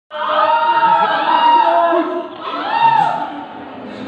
the mating call

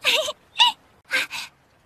giggle fairy; nintendo new 64 version

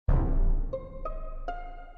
dead body reported; among us

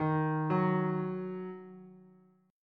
piano

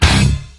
prison chord

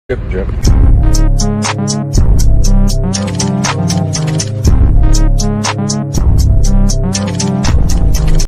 cool phonk

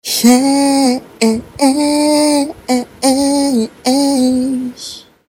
shish sung

